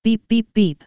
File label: beep beep beep